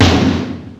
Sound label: Fairlight CMI III Snare, Drum Machines